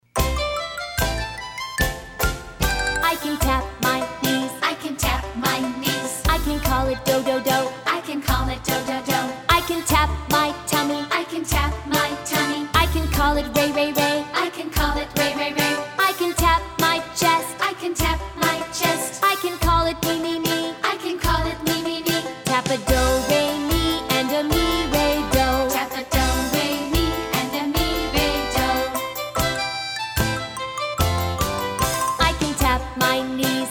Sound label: Do Re Mi Tapping Song